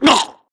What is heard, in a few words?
grunt death neck; Titanfall 2